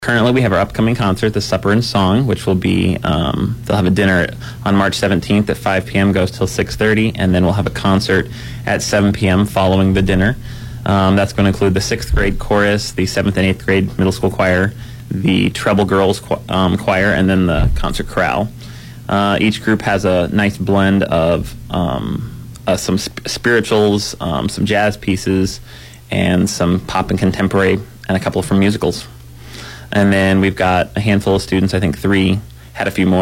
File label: Choir concerts; Wildcat News: School choir events coming up; 97.7 The Bolt; KHBT; HUMBOLDT, IA